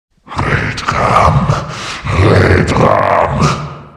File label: stabbed; freak fortress 2; corrupted snow